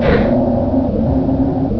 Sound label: bradley door move, tanks; hl1 npc